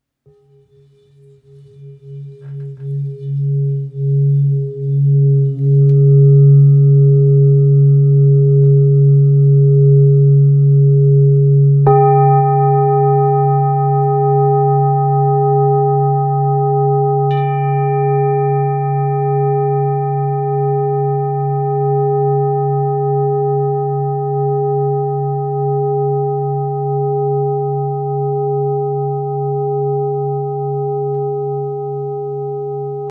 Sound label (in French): Bol bengale 2kg do dièse, Bol chantant